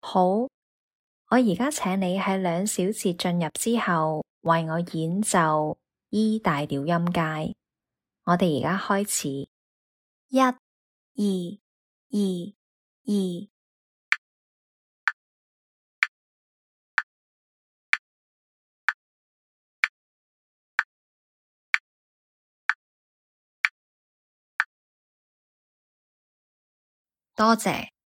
E maj scale

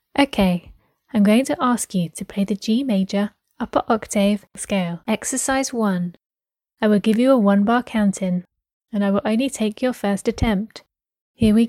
g Major upper both

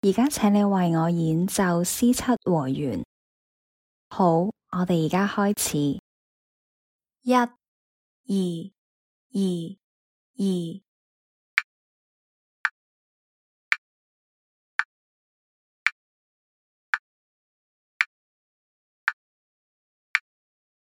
dom 7th in the key of C

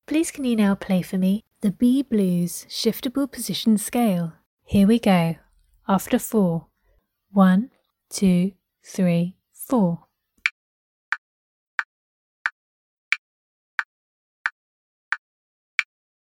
b blues shift

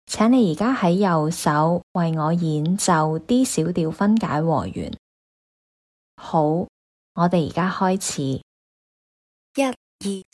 d min bro ch rh

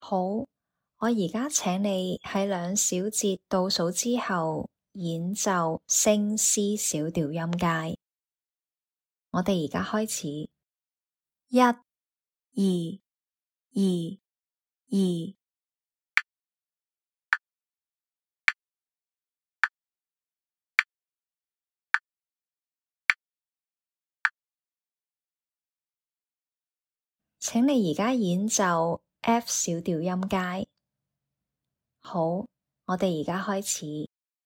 c sharp min scale F min scale

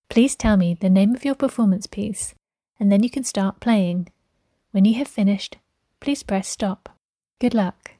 performance piece

